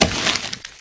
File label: open box; curiousgeorge